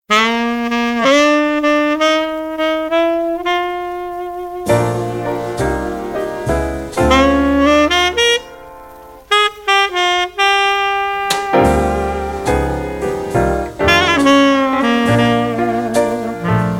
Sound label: Blues